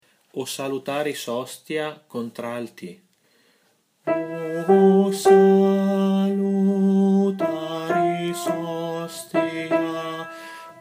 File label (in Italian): Contralti; O Salutaris Hostia